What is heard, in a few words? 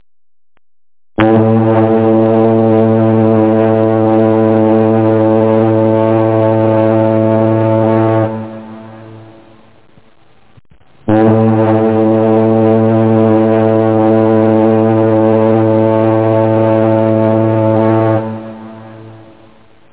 ocean lin